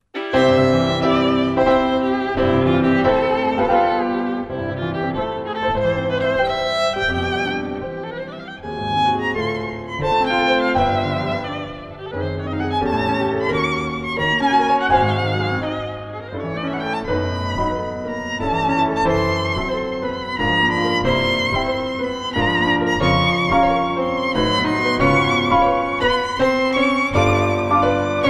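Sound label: violin sonata; sinding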